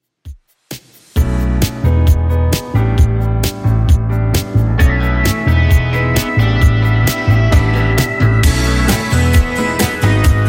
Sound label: 2ks Redrum 132bpm